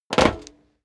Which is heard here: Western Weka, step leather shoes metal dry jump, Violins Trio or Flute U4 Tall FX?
step leather shoes metal dry jump